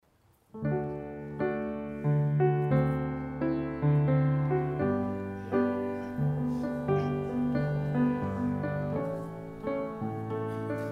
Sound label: Choir